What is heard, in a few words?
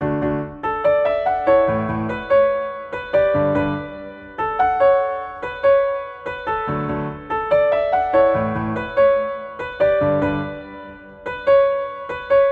piano